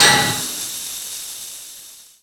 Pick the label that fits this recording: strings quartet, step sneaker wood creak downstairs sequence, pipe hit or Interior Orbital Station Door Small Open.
pipe hit